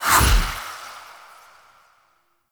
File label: Light Teleport; Warframe; Wisp